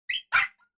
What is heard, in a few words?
bark; pablo